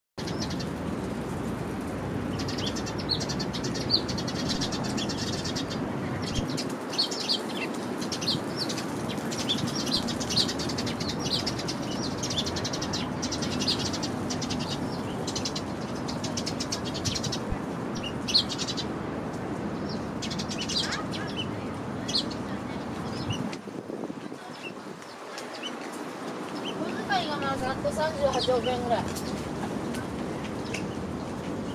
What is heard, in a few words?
Sounds of Hiroshima Castle